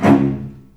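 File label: C#1, VCS arco, strings, L; East West; The Ultimate String Collection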